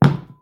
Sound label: pot land; environment; Dungeons Of Kharadum